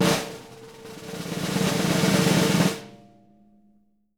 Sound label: snare roll, JZ XSNARES; Sampleheads; Peter Erskine Living Drums